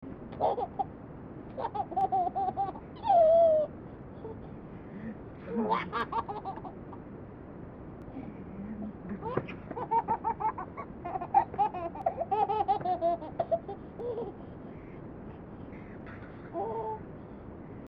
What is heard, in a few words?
long laugh